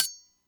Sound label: machete vs spatula